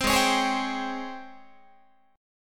G sharp Major 11th G sharp, Guitar; G#M11 Chord

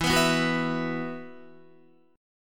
E Suspended 2nd E, down, Guitar; Esus2 strummed

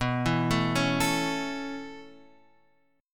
B 9th Suspended 4th B down Guitar Open F; B9sus4 arpeggiated; chord shape; JGuitar

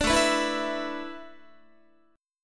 A Suspended 2nd Suspended 4th, Guitar; Asus2sus4 strummed

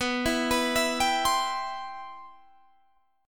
G 6th Add 11th B, chord; G6add11/B arpeggiated

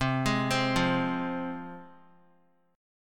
C Suspended 4th Sharp 5th, C, down, chord

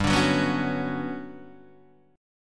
G Major Sharp 11th G down Guitar Open D

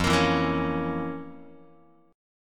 F Major 7th Suspended 4th Sharp 5th F, Guitar; FM7sus4#5 strummed; JGuitar

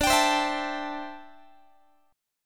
D 7th Suspended 2nd Suspended 4th D, Guitar; D7sus2sus4 strummed

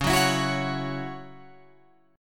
Db Minor Double Flat 5th, Guitar Open F, chord; strummed; Dbmbb5; JGuitar

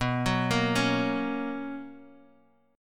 B Major 7th Suspended 2nd B; arpeggiated; 19,21,20,18; JGuitar

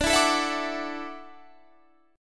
E 7th Suspended 2nd Suspended 4th, D

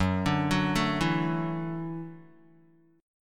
Gb Minor 7th Sharp 5th, Guitar; arpeggiated; JGuitar

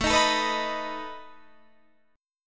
A Suspended 2nd Flat 5th A, guitar

